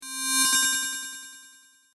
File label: Mail arrive, Mail Arrive: Alert To Indicate New E-Mail; Chimes; Multimedia; Stuart Duffield